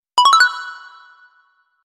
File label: Message Pops